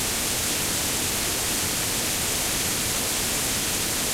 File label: Glitch Hand Inside; Game rip; MiSide